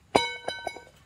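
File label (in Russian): удар по стальному брусу при строительстве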